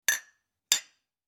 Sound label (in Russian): zvuki chokania na prazdnike